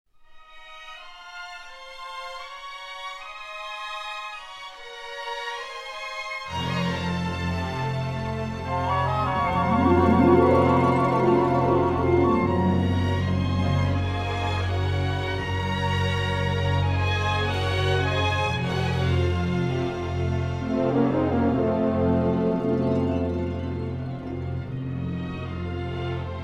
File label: Music